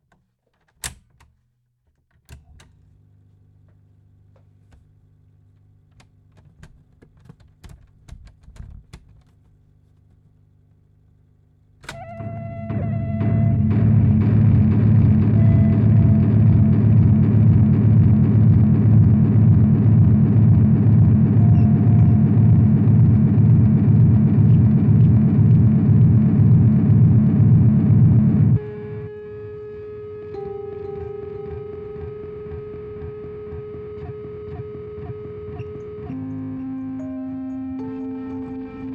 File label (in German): AbSpielen 4,7 cm (Lautsprecher Tonband), Grundig TK 747; soundcheck; 'sand'; Komposition